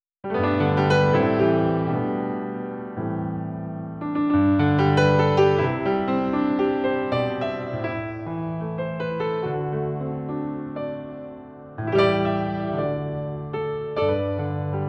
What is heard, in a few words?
Jingle Bells, piano